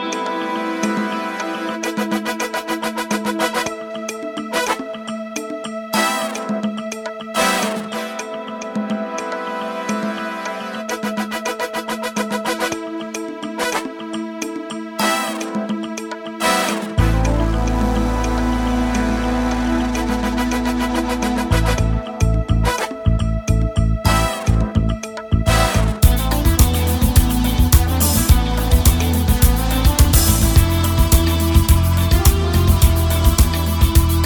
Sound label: Bossa With Guitar